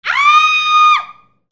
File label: PEOPLE